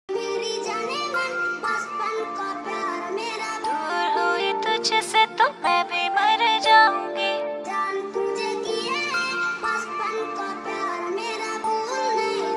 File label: rap ringtone